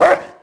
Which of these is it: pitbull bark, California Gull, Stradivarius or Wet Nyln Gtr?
pitbull bark